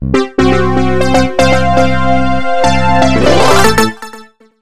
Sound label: Gameover